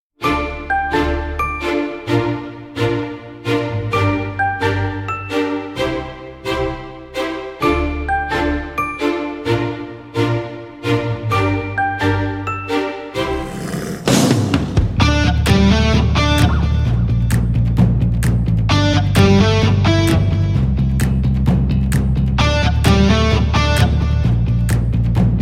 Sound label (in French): Musique